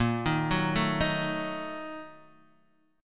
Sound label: A sharp Major 7th Suspended 4th Sharp 5th, Guitar, Open D